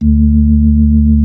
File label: B-3